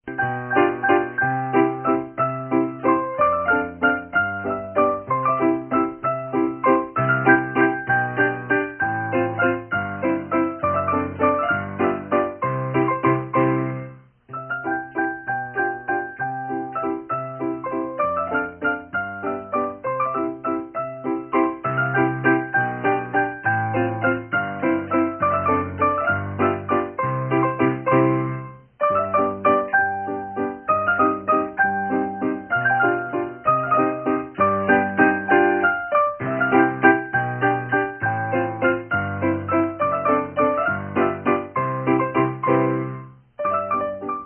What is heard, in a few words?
music Scherzo In C